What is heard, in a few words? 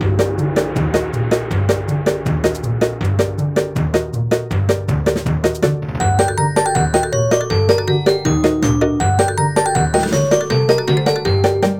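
background music map; ovenbreak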